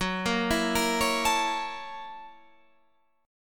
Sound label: F sharp 7th Sharp 9th, Guitar, Open F; F#7#9; 18,17,16,17,16,16; arpeggiated